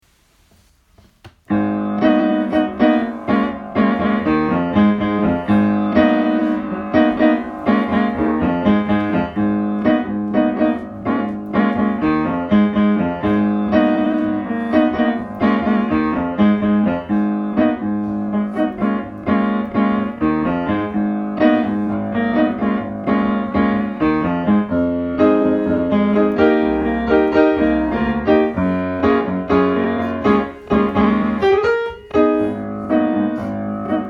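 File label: accompaniment eb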